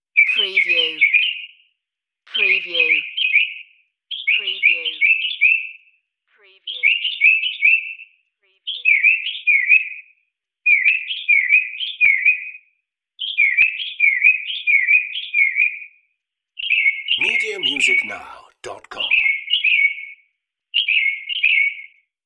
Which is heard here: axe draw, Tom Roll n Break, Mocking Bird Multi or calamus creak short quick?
Mocking Bird Multi